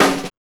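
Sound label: SD, snare drum; X-Static Goldmine 1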